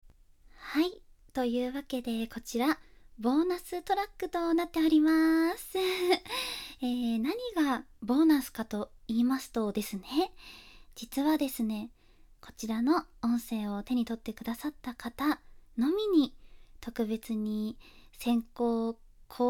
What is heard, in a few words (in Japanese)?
耳舐め練習; 耳舐め練習に付き合ってください